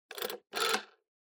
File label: Rotary Phone Hardware Dial